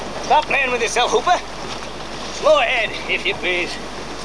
playing, orca

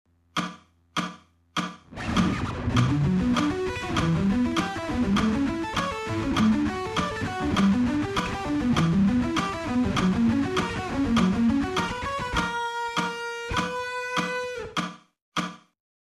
sweep picking fast

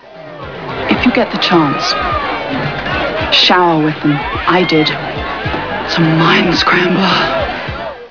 shower

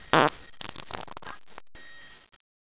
fart

